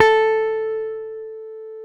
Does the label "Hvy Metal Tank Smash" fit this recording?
No